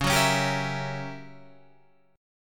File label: Fsharp 7th Flat 9th, guitar chord